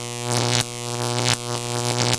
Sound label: Electro Mine Shock; PC Gamer (Italian) 32; SWARM